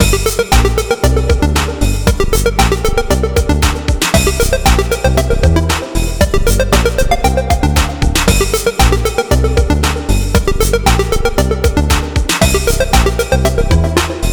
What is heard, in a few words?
ringtones; Honor Pad X7